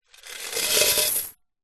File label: money coins in metal tin tip out; foley